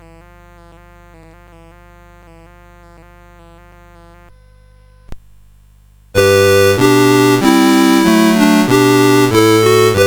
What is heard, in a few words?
Evening Hymn, CSG8580